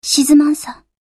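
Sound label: attack; hibiki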